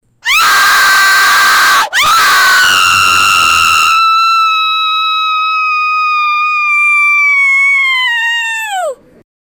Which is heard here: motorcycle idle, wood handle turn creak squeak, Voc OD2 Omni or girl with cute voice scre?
girl with cute voice scre